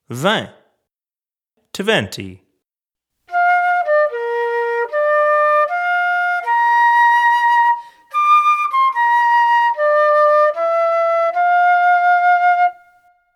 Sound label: Flute